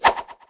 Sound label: miss; GrandFantasia